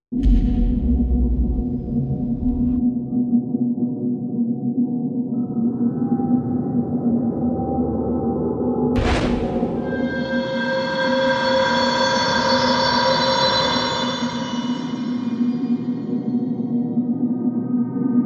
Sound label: TENSION DISTORTIONS